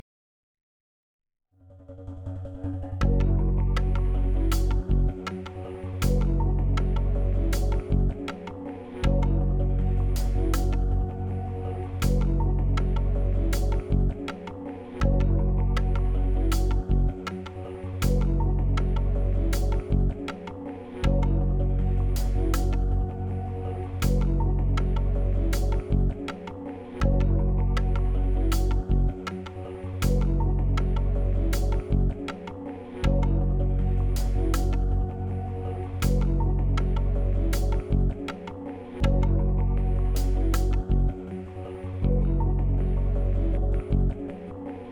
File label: Minimal Loop; bakugan champions of vestroia 2020; switch gamerip